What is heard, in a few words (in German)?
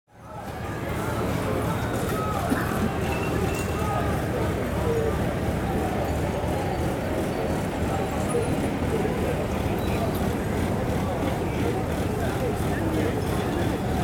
vorm Wasserwerfer